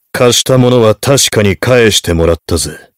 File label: heavy positive vocalization